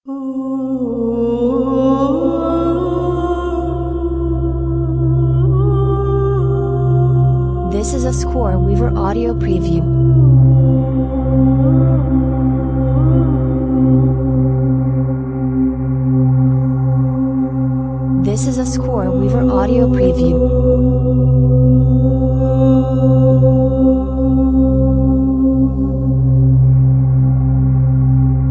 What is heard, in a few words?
Deserted Ruins No Flutes; scoreweaver